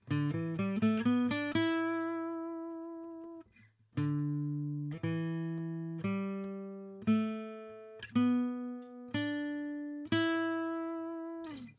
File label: Pentatonic Lick, guitar